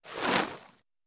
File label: Arrow Sizzle Bys Heav; bow & arrow bys